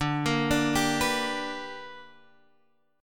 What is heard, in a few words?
B Minor Major 7th Sharp 5th